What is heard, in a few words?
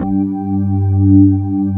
B3 HAM, Keyboards Old School